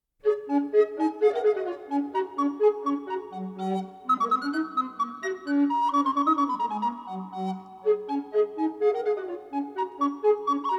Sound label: hornpipe